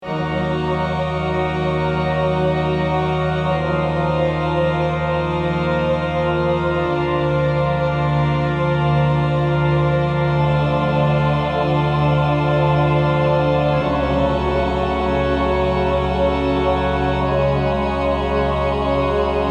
Cathedral Gloom

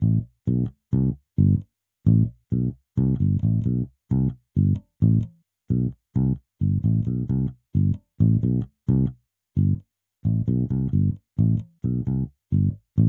Elec bass; super crush k.o.

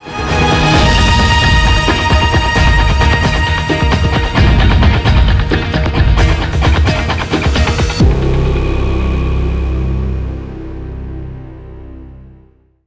mp victory; Call of Duty: Modern Warfare Remastered; sas

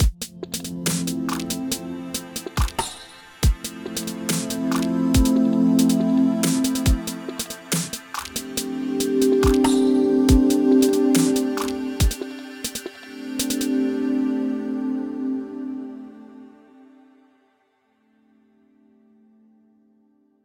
Tessellation Loop

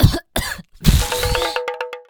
China Hit Sound; Jolly Battle; Android

